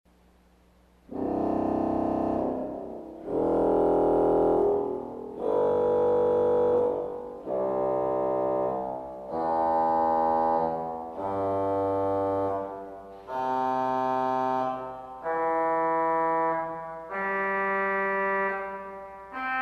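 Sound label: Bombarde Arpeggio, organ stop; CulverAcademy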